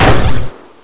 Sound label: arrow, archer; HEXEN2